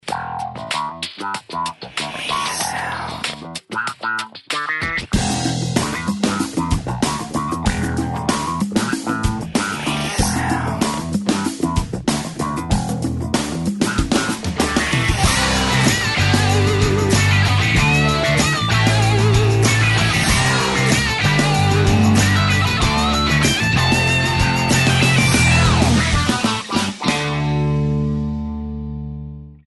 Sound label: Reach Out - 95BPM, rock